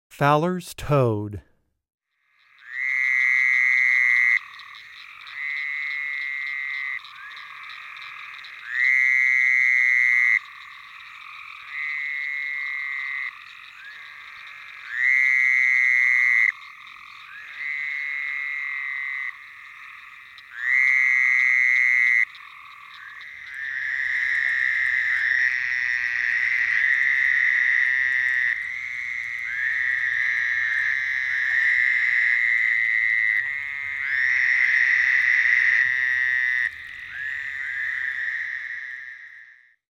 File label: Fowler's Toad, Wildlife Sounds